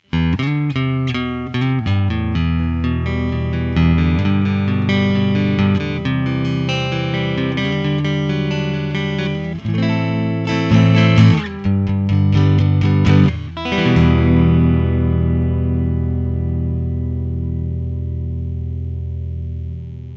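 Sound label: japan neck, guitar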